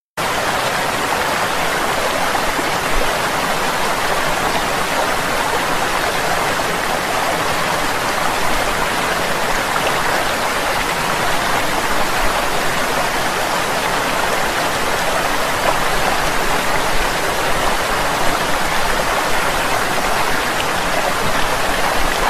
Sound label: White Noise Waterfall